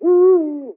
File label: AMB China 3D Amb